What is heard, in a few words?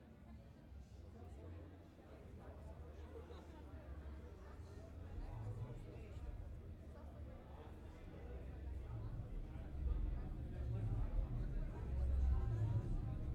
noiseland